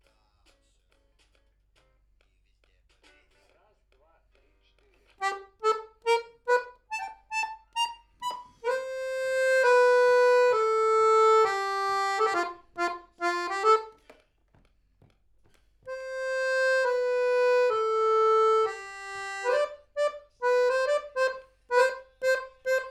Accordeon MAIN

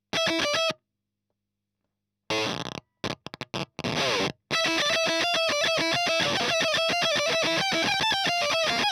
EL Fuzz End

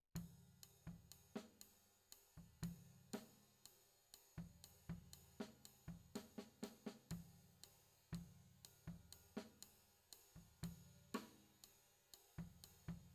Floor

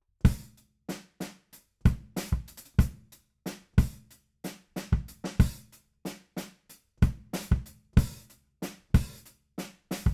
BD Out

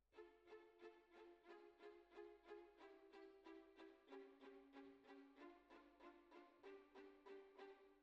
Oboe

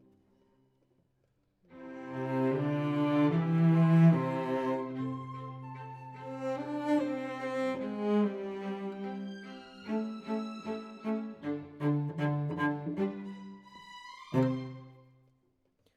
Cello Solo

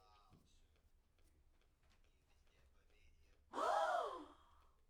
Backs SCHOEPS

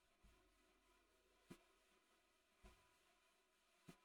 SNR Bot

